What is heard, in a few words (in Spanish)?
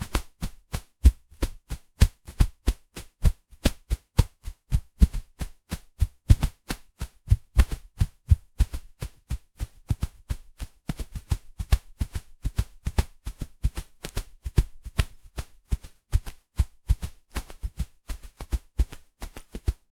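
secuencia rítmica de golpe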